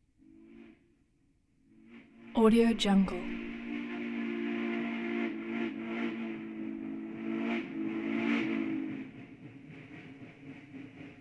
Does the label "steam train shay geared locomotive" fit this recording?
Yes